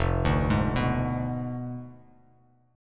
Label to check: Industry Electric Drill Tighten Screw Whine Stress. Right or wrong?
Wrong